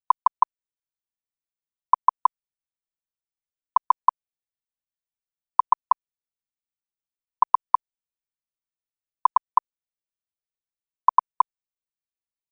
stimulus patterns; empty durations are marked by 10 ms pure tone bursts of 1000 Hz including a rise time of 2 ms and a fall time of 5 ms; stimulus patterns of Figure 3, first series